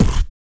VOX PRC, VOX KIT; ILIO; Vocal Planet VOL-3; Jazz & FX

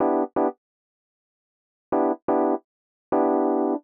125 Rhodes A B, Keys, Nu Jazz House; PowerFX - Nu Jazz House

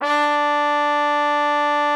D4, TRUMPET; Xsample VOL-3

